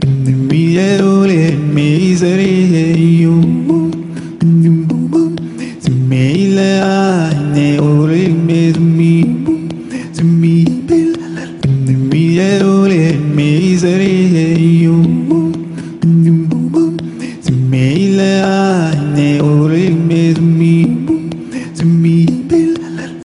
Vocal Music

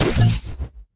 blink; tracer

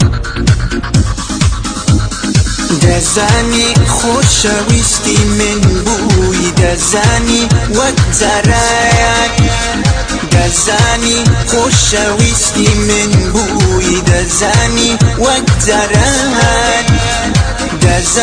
ringtone

